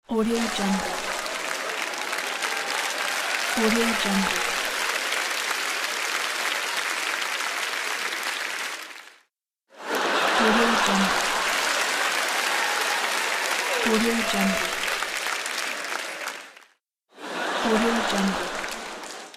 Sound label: crowd laughs cheering and applauding